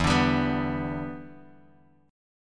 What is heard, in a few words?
E Minor 7th, down, Guitar, Drop D